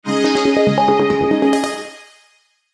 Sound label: Logo Synth Sequence; Blastwave; Sonic Logos